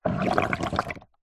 foley liquid water air bubbles surface shallow short plastic